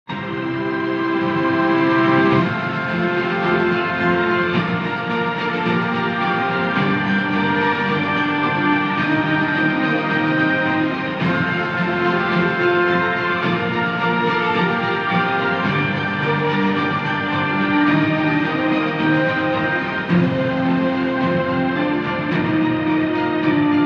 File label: Instrumental